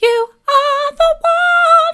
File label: soft, vocal